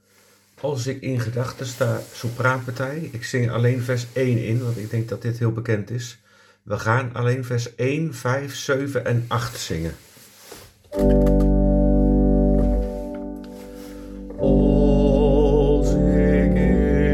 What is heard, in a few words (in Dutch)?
Als ik in gedachten sta sopraan